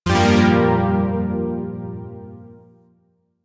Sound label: Shawzin Blues Chord; Zariman Shawzin